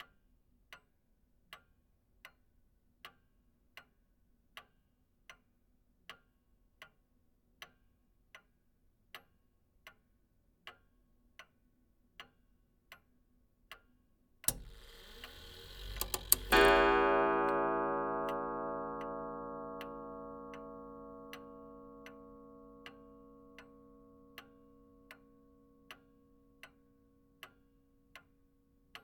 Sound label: Antique Clock Ticking Then Strikes Once